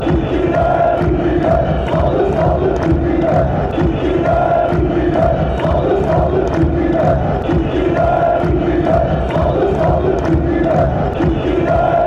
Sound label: turkey fanchants